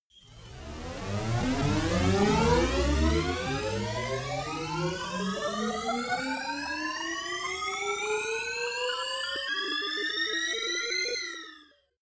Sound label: hover glitch, Risers; whooshes rises